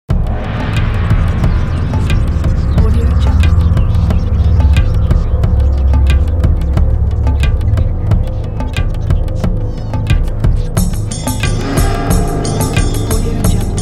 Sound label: creepy and horror